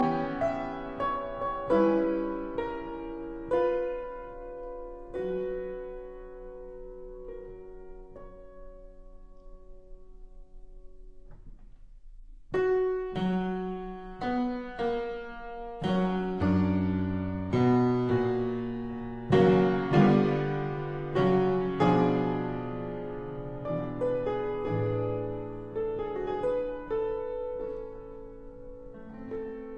Keyboard Sonata in B minor Molto adagio e sostenuto Allegro con fuoco; Op 40 No 2